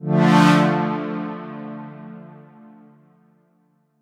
Dm, Chords; SailorLost; M8; Electronisounds

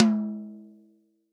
TOM, Real Drum Kit, POP KIT

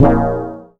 BELL-GONG, A#; Big Fish Audio

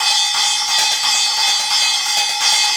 Cymbal Pattern; Sonic Foundry (Sony Creative Software) - Essential Percussion

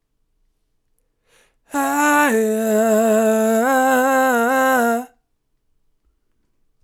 Soul Vocals Dm 140 BPM, Melodies; 99Sounds x Monosounds

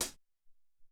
Clo Fi Hat, Alesis SR-18

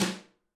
Snare Brush; EMU PX-7

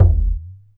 Perc, Alesis DM5

